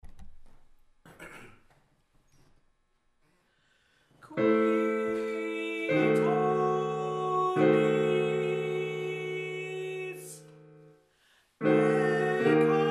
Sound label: Alto; Qui Tollis